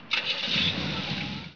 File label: starter